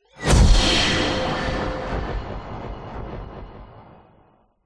sound design cinematic whoosh impact futuristic sci fi; zapsplat